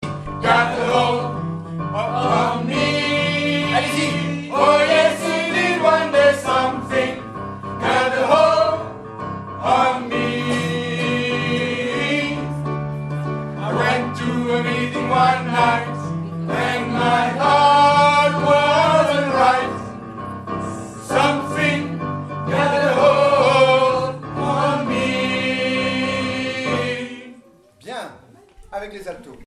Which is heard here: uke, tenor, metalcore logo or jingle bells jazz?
tenor